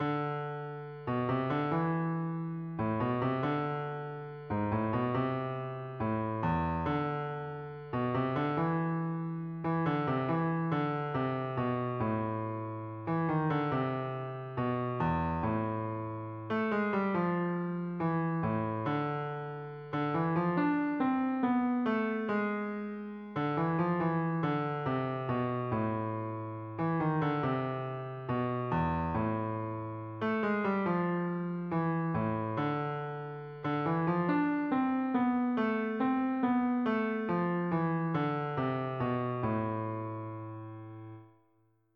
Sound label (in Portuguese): marcha dos marinheiros; music